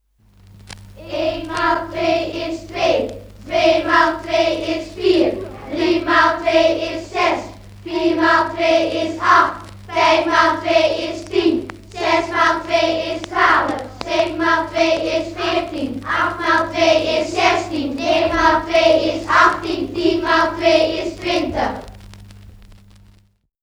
klastafel van twee

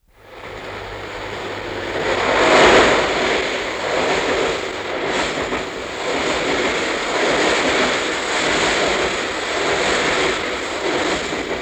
trein voorbij bomen open

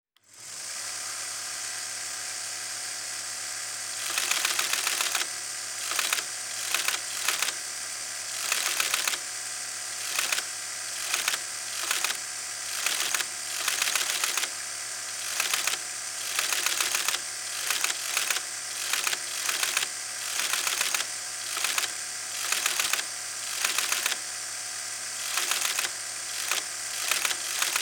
teletype heel oud

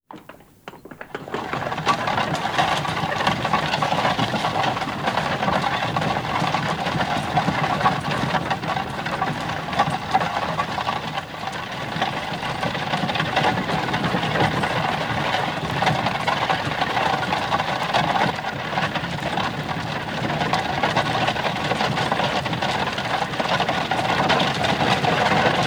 postkoets

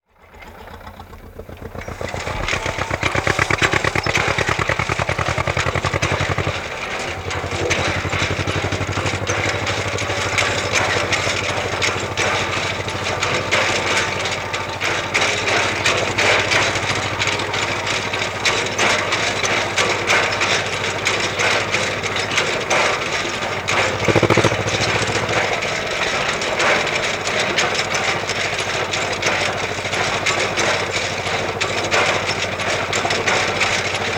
hooi schudden tractor

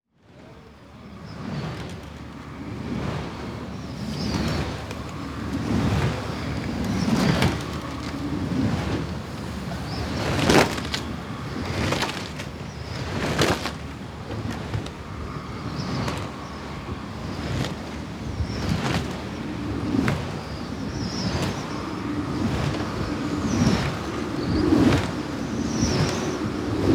molen wieken stereo

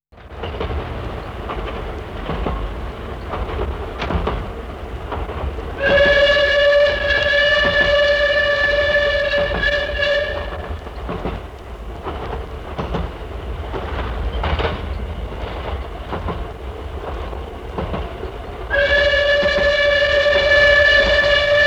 stoomtram met fluit

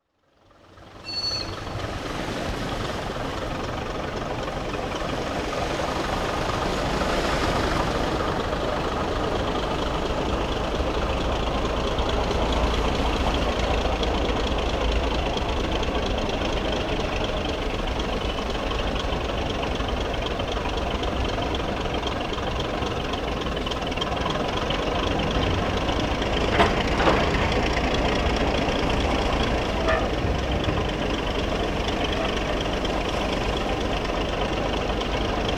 vissersboot netten binnen

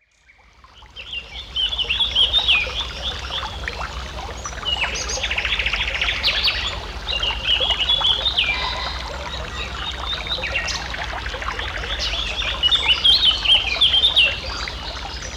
Ned bosbeek vogels

